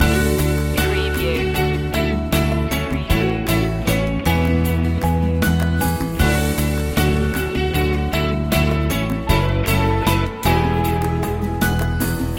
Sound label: promising voyage loop